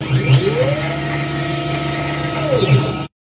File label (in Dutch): trekhaak lossen